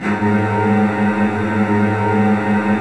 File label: G#1, Strings, STR HLF TRLL; ILIO; Kirk Hunter Virtuoso Strings VOL-3; 90 s Sample CDs